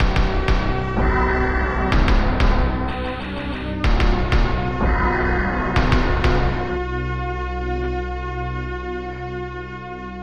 Boss Battle; Alien; Insaniquarium

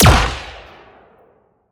Sciweap Shot Small Gun Chaos Colt

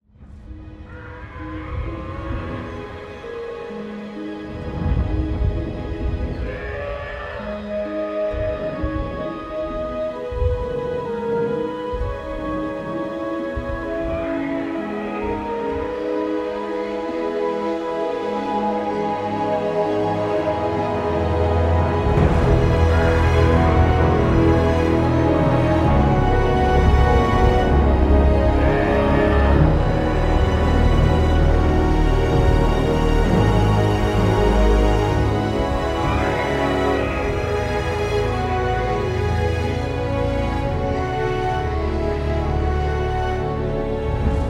Log In; war of the immortals pc gamerip

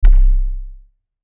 UI Cooldown Ability; we are the dwarves gamerip 2016

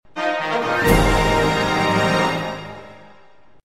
Item Found (rarest)

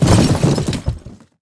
cardboard boxes break

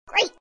exclaim; Chibi Knight

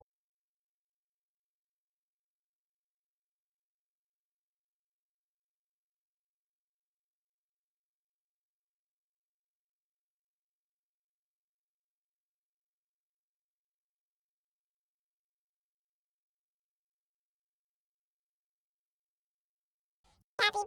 Vocal Only; Capybara Stage 1

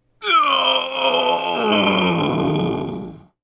Grunt

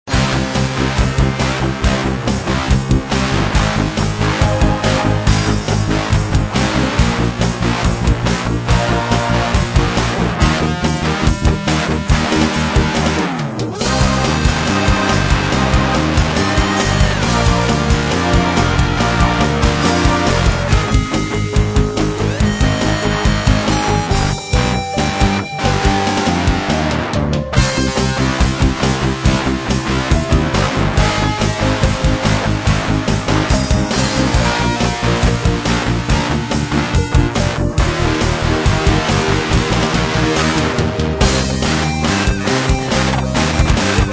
Guitar; Dad 'n Me

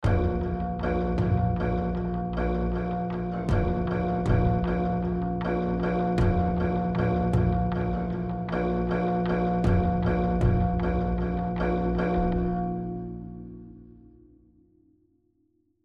Rage Combat

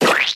Button Press; Timez Attack

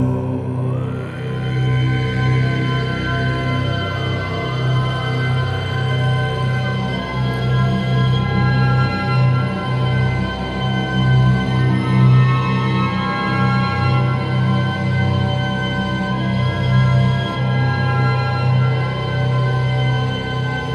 Platforming Low Intent; song of iron

